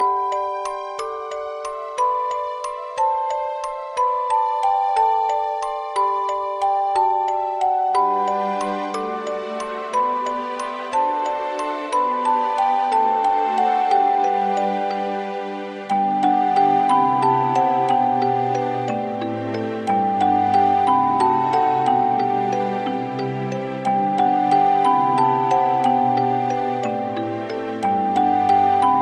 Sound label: midi music box ringtone